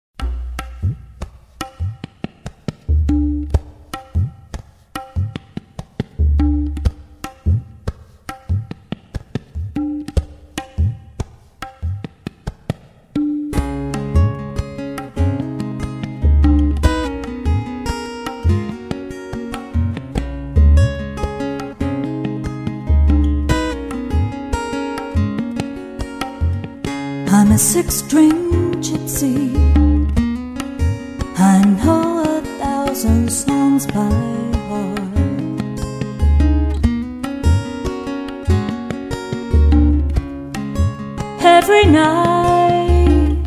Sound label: Six String Gypsy